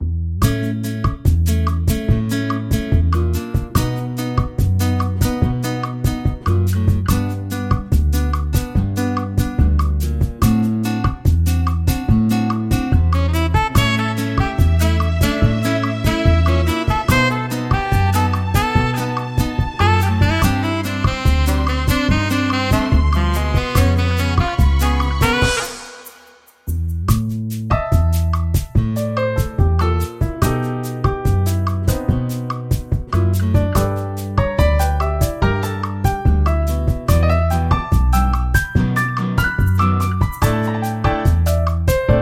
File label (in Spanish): musica jazz samba tranquila